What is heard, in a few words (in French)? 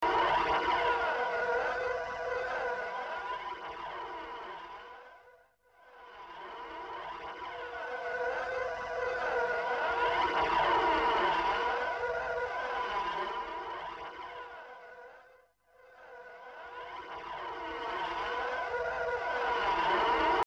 Moteur de TIE; Star Wars